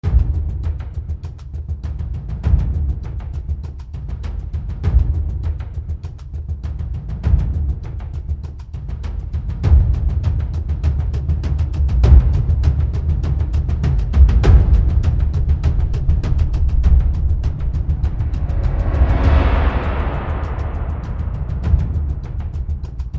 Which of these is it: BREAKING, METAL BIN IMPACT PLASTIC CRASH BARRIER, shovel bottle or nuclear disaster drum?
nuclear disaster drum